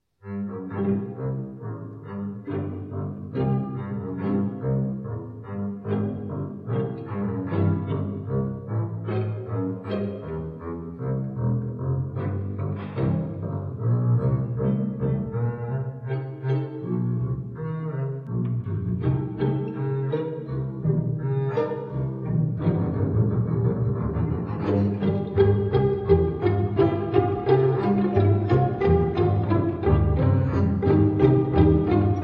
Contrabass

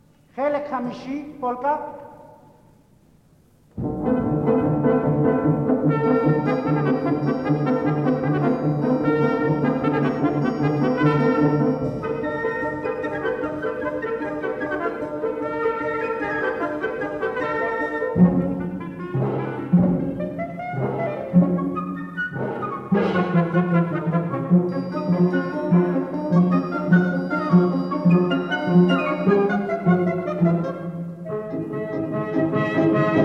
Israeli Country Dances Polka

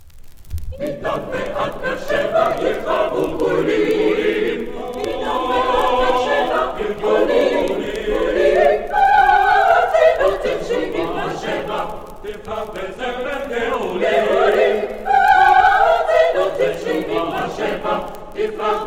A Cappella Choir

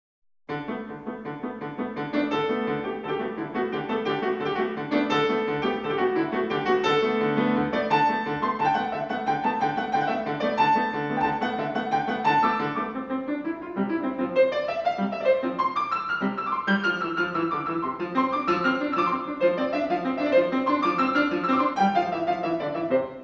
Polka

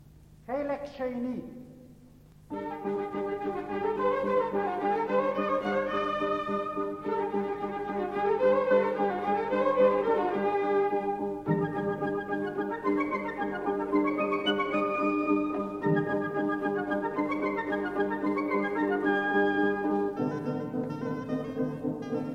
Israeli Country Dances Mountain Folk

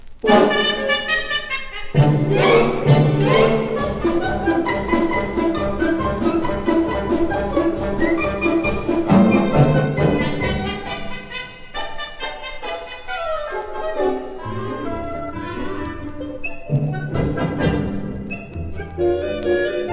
Symphonic Orchestra; The Monkey

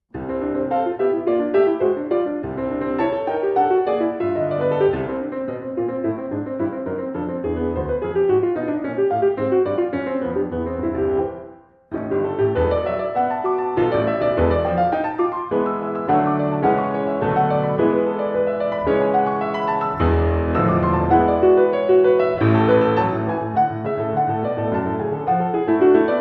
Variations for Piano Vivace

